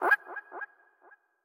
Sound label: Gigabat Hurt